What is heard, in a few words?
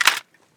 pp19 mag out; bizon; garrysmod